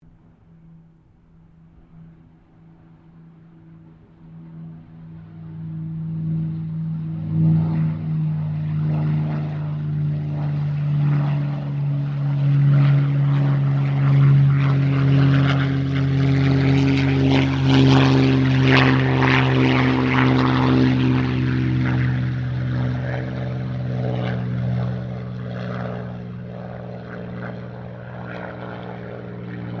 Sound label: AIRCRAFT SUPERMARINE SPITFIRE MK-XVI PASS; Airplanes (Sound Effects:Vehicles)